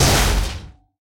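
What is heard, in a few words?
phantomknight damage; thedead3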